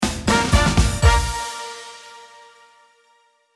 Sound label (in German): Tusch; Narrathuerium